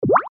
powerup; Adventure Time - Elemental; ThrillGames

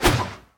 jump; Power Rangers vs Teenage Mutant Ninja Turtles - Ultimate Hero Clash 2

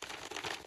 wardrobe previous costume; run-dorothy

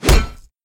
fist hit; Nickelodeon - Destruction Truck Derby

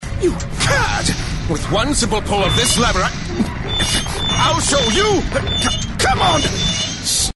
New Wave; Ben 10 - No Arm Done

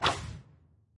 move; The Powerpuff Girls - Trail Blazer; NextlevelGames

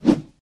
Player Move; The Powerpuff Girls - Mojo Mayhem; NextlevelGames

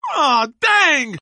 lose; bebop

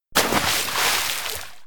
slime splash; Crash the Bash - Drink Break

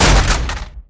explosion; Gotham City Speed

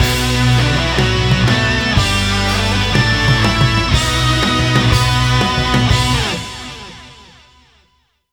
Monster Trucks Victory Stinger; Nickelodeon - Destruction Truck Derby

